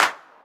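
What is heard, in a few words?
clap lo-fi, drum machines; 300 Drum Machines; E-MU Orbit-3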